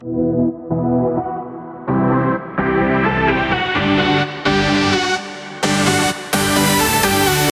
Saw, supersaw, chord progression, top melody, E minor, rising low-pass, 4 bars, 128BPM; Audialab EDM Elements